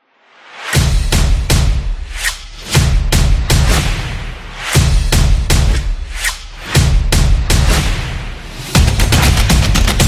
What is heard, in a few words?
Promo Action; stomp and clap